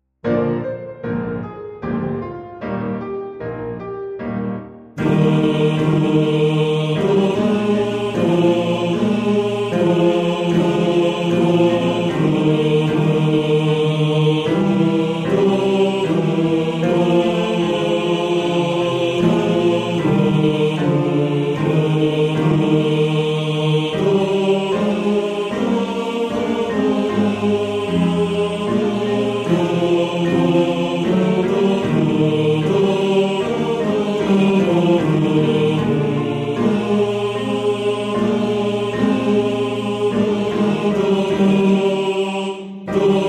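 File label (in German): Bass 1, Männerchor; Santa Lucia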